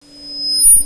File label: feedback